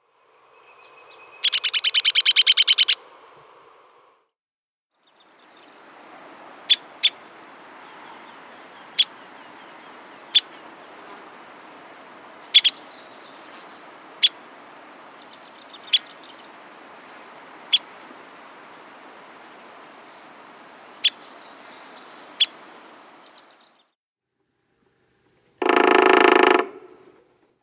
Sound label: Ladder backed Woodpecker